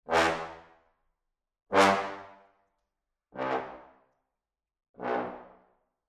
Brass 4 Tbs Staccatissimo

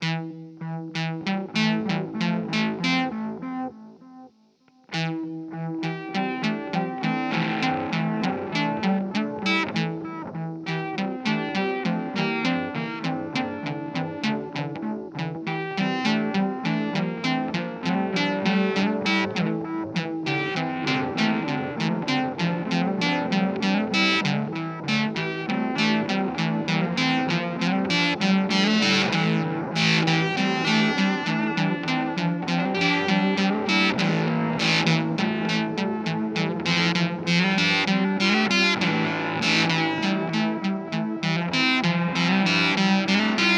Teisco

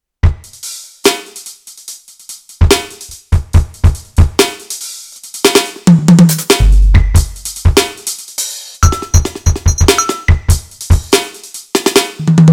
Roland TD-4KP

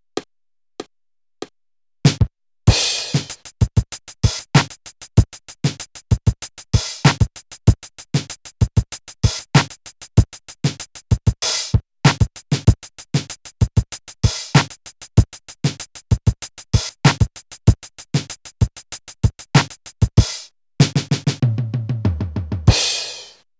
Korg DDM 110

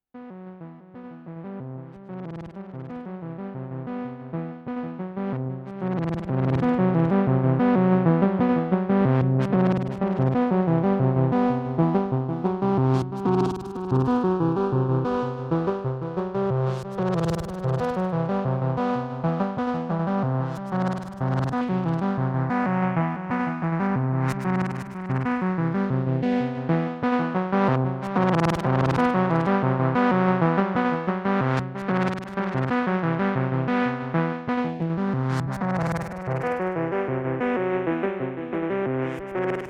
Wampler Terraform Malekko Manther Phaser

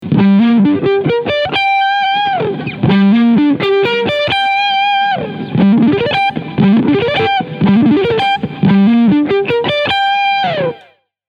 ARPEGGIOLINES

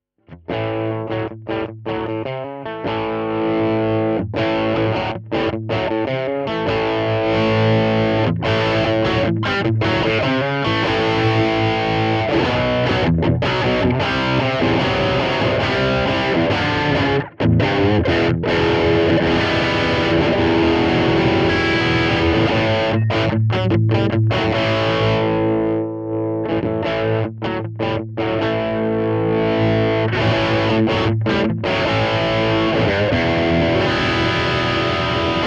Tubescream Line

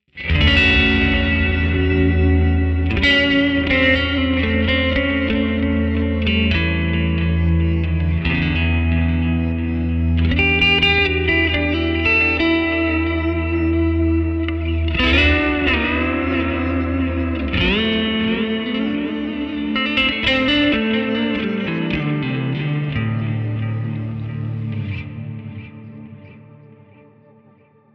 Clean And Cheerful; Neural DSP Archetype Rabea

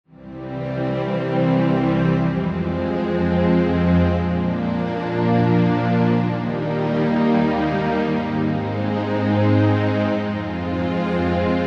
Steinberg Halion 6 Studio Strings

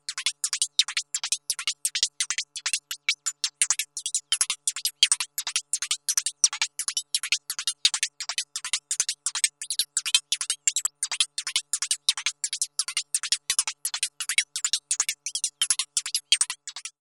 Panharmonium Fast Water Log Percussion; Rossum Electro-Music

